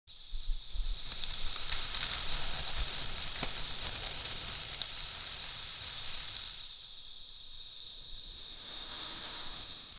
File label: a tropical island the sou, tropical